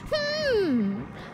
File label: Humpf; noises